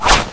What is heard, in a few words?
knife slash; cstrike; JailBreak; Rambo